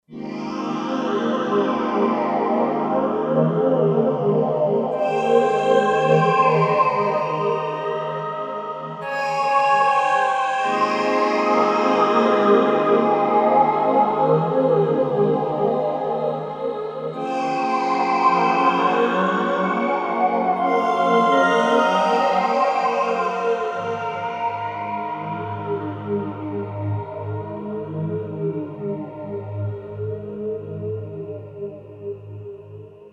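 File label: 5th String Pad; Roland SC-880; Factory Patches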